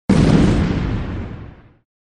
Sfx Meteor Hit

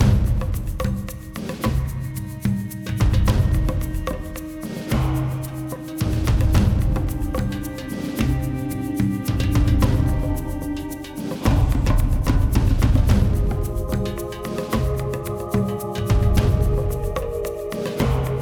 Garden Main

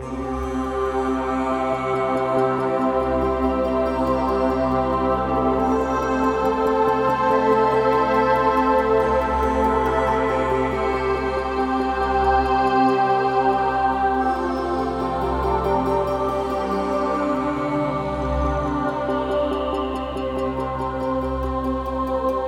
Player Neutral

